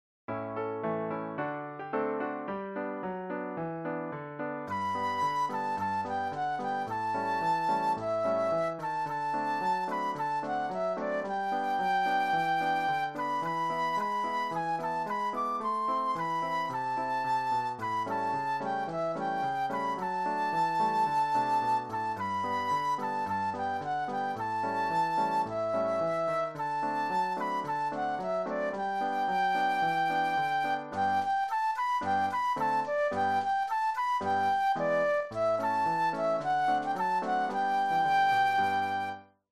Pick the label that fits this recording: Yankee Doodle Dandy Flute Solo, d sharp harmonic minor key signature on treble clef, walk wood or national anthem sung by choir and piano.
Yankee Doodle Dandy Flute Solo